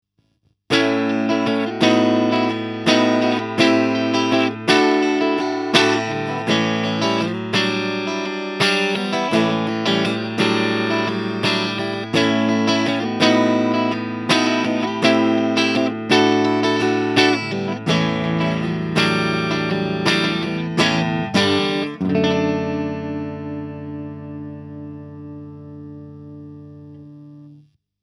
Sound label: Clean